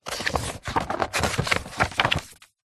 household book thick hard back cookery page turn struggle; zapsplat